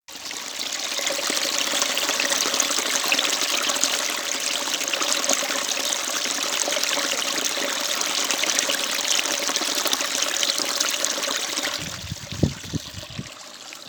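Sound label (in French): Cascade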